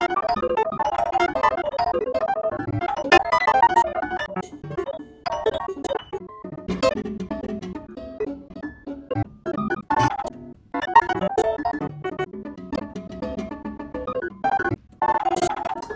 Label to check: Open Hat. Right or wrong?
Wrong